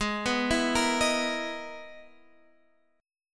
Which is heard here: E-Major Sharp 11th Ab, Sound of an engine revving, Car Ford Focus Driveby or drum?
E-Major Sharp 11th Ab